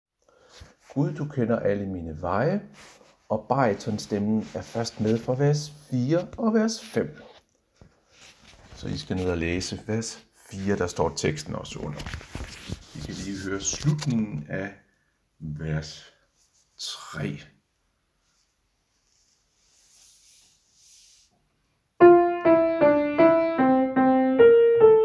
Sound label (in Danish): baryton, koret; Gud du kender alle mine veje